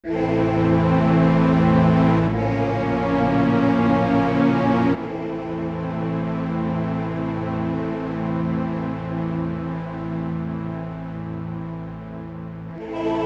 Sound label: music organ choir